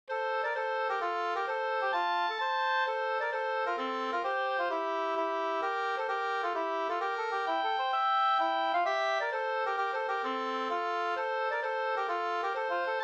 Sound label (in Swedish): mazurka; e Lindberg e Axel Wester V Vingaker OA044 2st